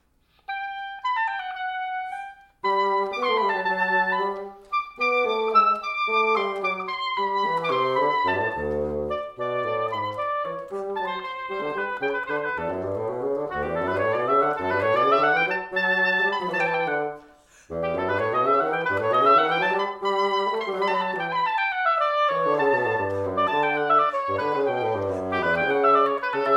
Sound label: duetto; CPE bach